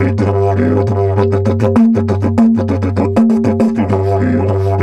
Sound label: G 100, DIDGE 100BPM, Didgeridoo